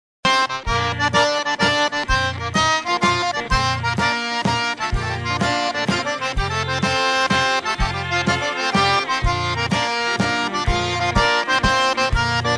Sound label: Waltz